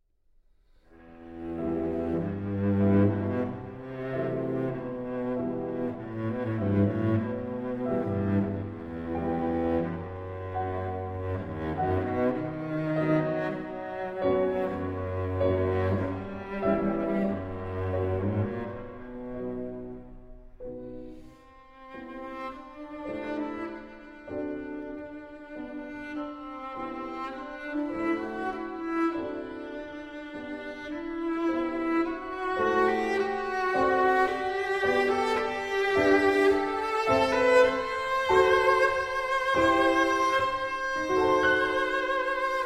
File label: Sonata For Cello and Piano in E Minor, Allegro Non Troppo